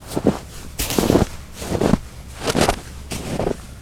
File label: Footfalls In Snow; footsteps